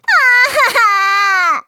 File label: crying; monokuma kid